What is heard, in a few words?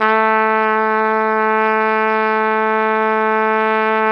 Flugelhorn; Roland LCDP12 Solo Brass